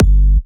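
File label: Drum Loop 128BPM bass drum G; VEC5 Drumloops; VEC5 Multi Loops 128BPM; Loop Elements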